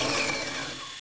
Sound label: laser minigun change end, weapons